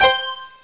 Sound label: RINGS